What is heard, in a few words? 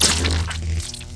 machine damage, monster